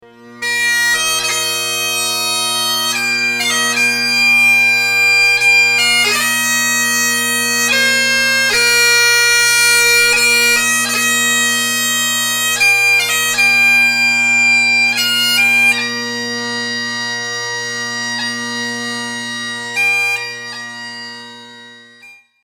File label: Amazing Grace Bagpipe Version